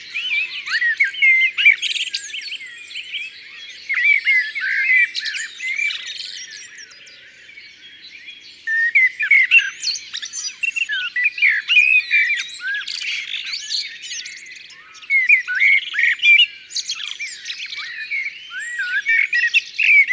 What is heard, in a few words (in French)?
Merle Noir, Ardeche; Concerts Naturels De l'Ardeche